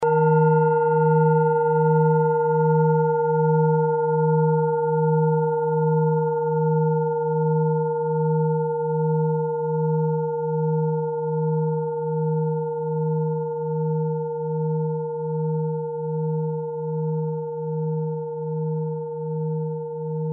klangschale set

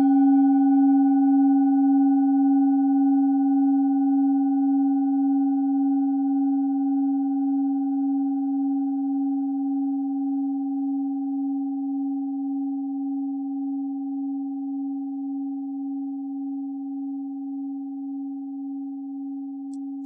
klangschale set; Klangschalen-Chakraset N°7 für alle Chakras

